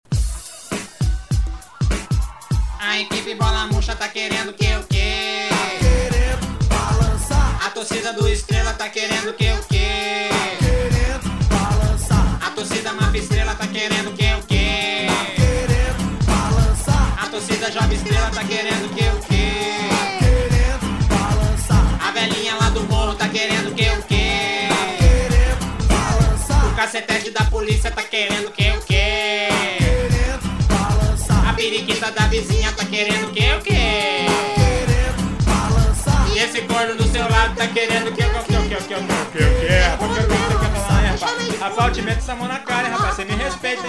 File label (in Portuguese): MÚSICA